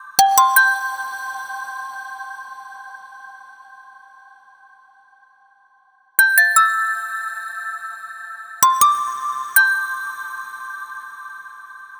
Little Metal, молоточки

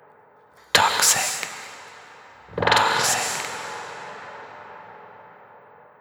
сэмпл вокала эмбиент

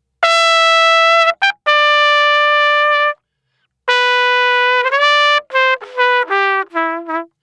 Trump Solo B, сэмпл трубы диско хаус; trumpet solo

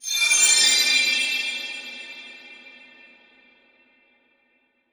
Gliss, звуковой эффект drum bass

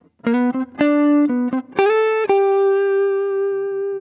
Melody, джаз

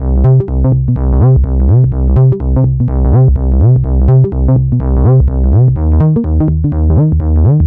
Swing Seq, минимал

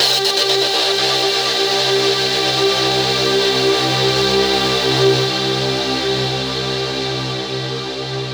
Gated Pad, атмосферный звук электро поп

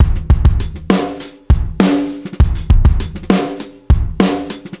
Grooove, рок

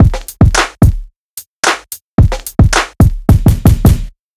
ударные и барабаны rnb soul old school

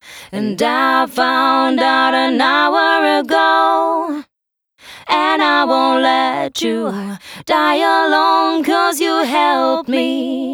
Chorus Double, женский вокал

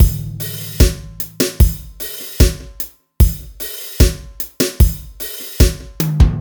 Jazzy, сэмплы ударных регги

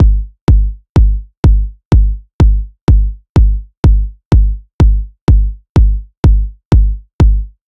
сэмплы ударных дэнс электро; Walk On

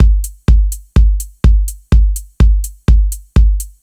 сэмплы ударных дэнс электро; Revenge

